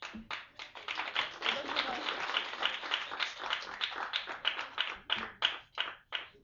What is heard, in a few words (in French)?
applaudissements